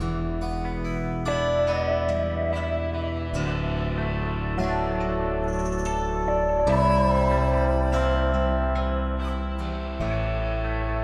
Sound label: Bar Slow Theme; They Always Run